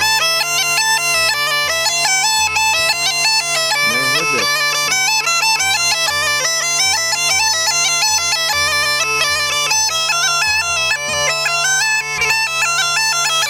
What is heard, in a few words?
Nestinarsko Ruchenitsa Part 1, Small Gajda Lessons